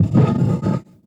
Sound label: Dragon Growl